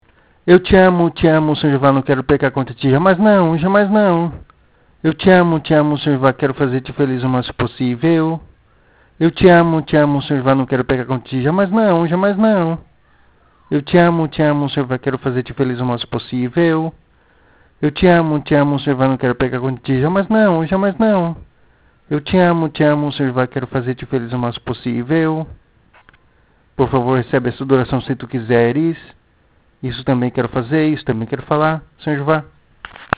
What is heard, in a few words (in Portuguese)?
em so voz